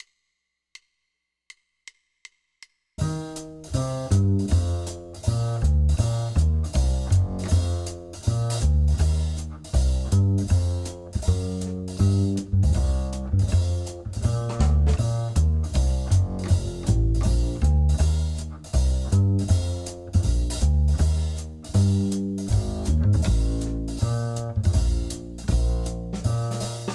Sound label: up tempo swing piano, jazz; LMEA All-State Jazz